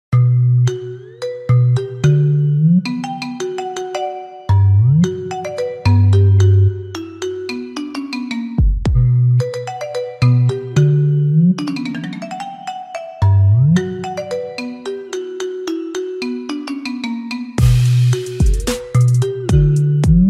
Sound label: simple ringtone